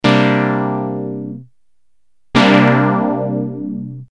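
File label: poly unison; nord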